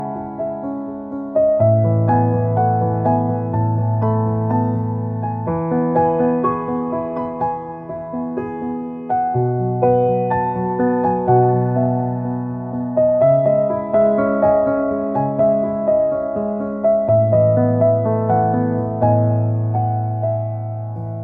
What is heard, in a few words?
Romantic Ringtone